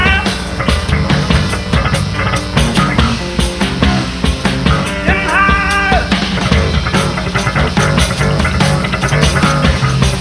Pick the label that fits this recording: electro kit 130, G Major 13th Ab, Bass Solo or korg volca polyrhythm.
Bass Solo